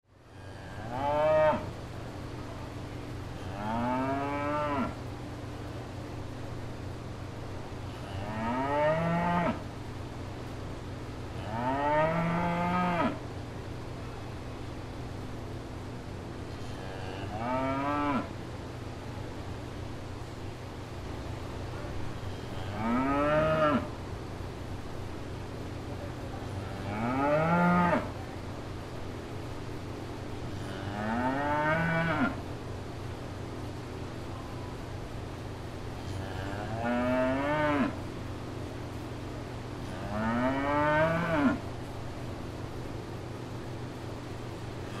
Cow Fair Grounds, Cow Fair Grounds People Background Ambience; Animal; Cows; Blastwave